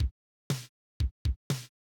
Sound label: drum